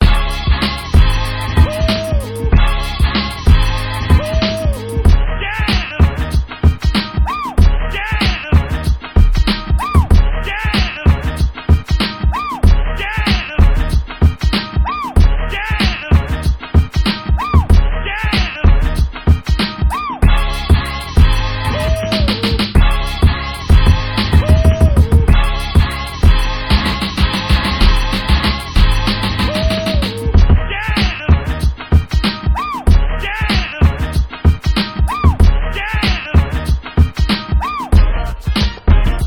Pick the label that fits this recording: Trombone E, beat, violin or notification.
beat